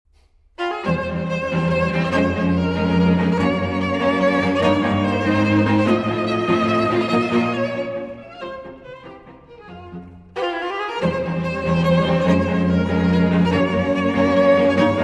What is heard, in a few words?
String Quartet in F Minor, Allegro Assai